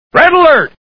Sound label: red alert